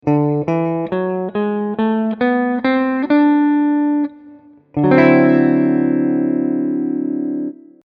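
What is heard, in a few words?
lydian b7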